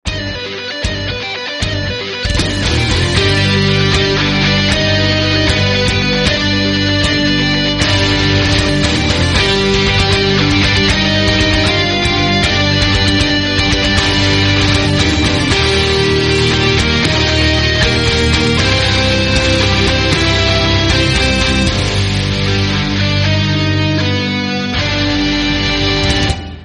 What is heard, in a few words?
theme song English; marvel studios